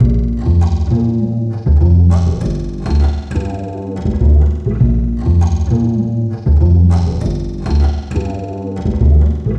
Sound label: Remixed Acoustic Bass